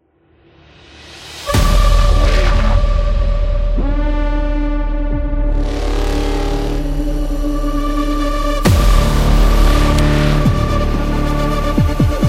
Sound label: Action Horror Trailer